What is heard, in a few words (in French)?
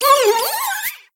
Cri